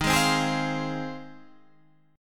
F Minor Eb; Fm/Eb strummed; JGuitar

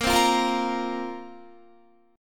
A sharp Suspended 2nd down Guitar; A#sus2 strummed

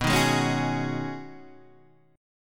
B 6th Add 9th B Guitar DADGAD, chord

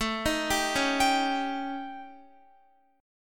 A 7th Flat 5th Guitar; A7b5; arpeggiated

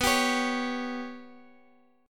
B Suspended 2nd Sharp 5th down Guitar Open F; Bsus2#5 strummed; JGuitar

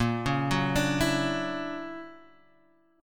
D Augmented Major 9th A sharp, guitar

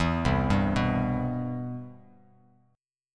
C Major 7th, Bass Standard; CM7 arpeggiated

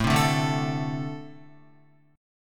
A-Minor Double Flat 5th A Guitar; strummed; JGuitar

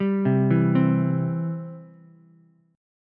C Major, C, down, Ukulele; C arpeggiated

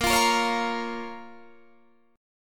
Bb Minor, Guitar, Half Step Down; chord shape

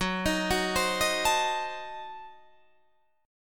Gb Diminished 7th Guitar Drop D

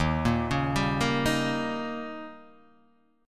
Eb 7th; Eb7 arpeggiated; JGuitar

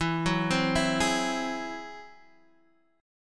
G sharp Augmented 7th

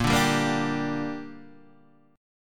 A sharp 6th, guitar chord; A#6; strummed; 6,5,5,3,3,3

